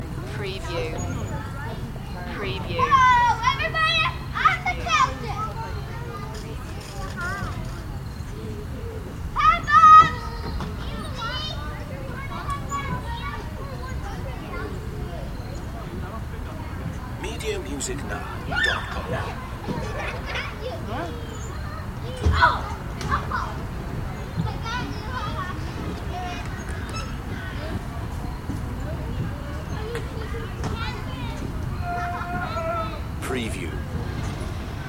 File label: Kids Playground